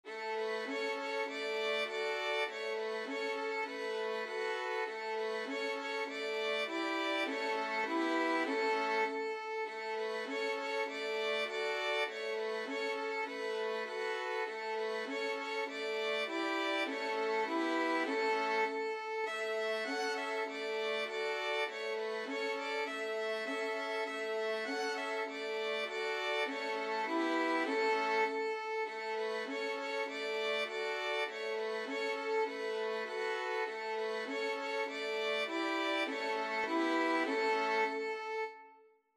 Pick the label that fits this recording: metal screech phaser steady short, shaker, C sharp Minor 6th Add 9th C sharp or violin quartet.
violin quartet